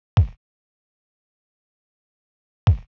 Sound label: Kick Old; hydrogen